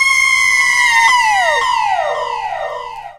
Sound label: vox special; Ueberschall; Techno Trance Essentials